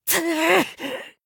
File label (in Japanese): 被ダメージ; ラッセル